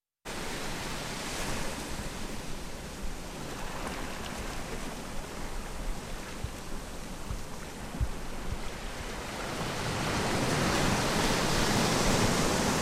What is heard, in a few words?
baja ocean